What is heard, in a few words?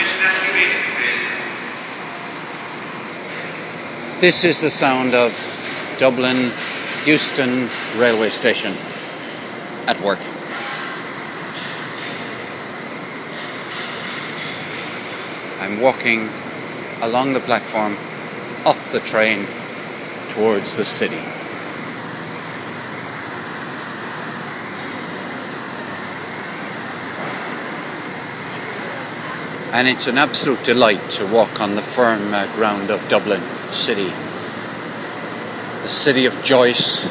arriving in dublin off train from cork